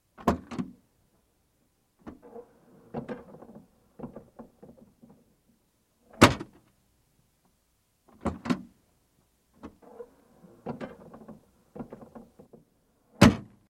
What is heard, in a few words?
Mercedes trunk open close; Cars